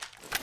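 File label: reload empty movement; gmod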